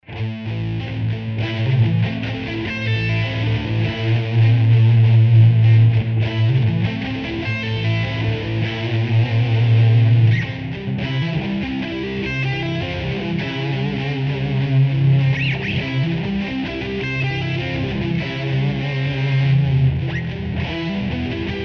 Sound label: arpeggio, guitar; guitar arpeggios part 1